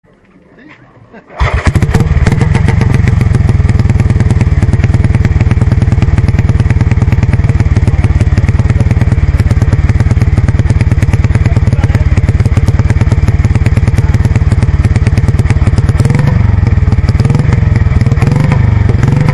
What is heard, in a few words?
gnome junior250